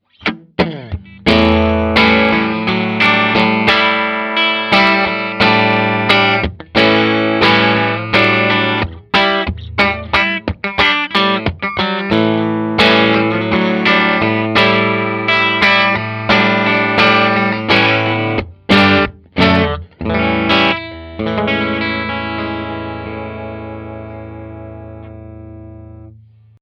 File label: Morgan PR12 Clean Bridge PU